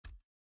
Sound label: ui screen nav backward; everdawn